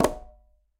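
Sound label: Conga A Flam Mute; Latin Percussion; Drums; Drum Hits; Ableton; Factory Packs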